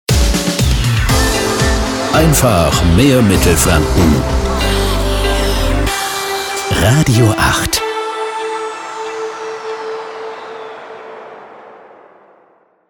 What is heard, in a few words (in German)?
Transition Med Dance 2 soft aktuell; RADIO 8